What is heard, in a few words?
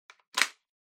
Power Strip Hand Hard Plug In